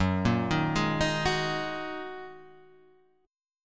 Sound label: G sharp 7th Suspended 2nd F sharp